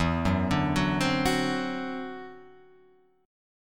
E 7th Suspended 2nd Sharp 5th, E, down, Guitar, Open F; E7sus2#5; arpeggiated